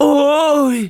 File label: Drums; Twitch Drums